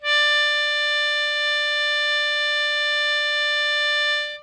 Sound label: hohner soprano melodica D4